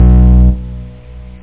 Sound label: ROSEWOOD GUITAR